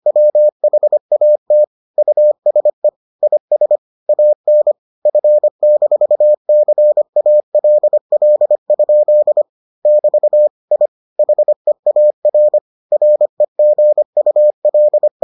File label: morse